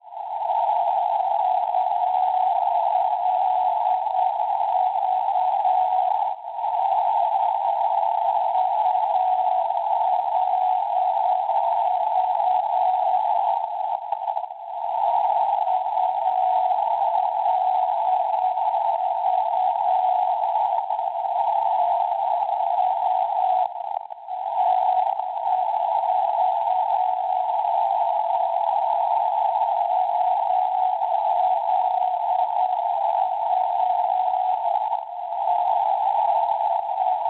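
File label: NDB non-directional beacon, 360 kHz; ULV; Bronnoysund; Norvegia; Schwarzsee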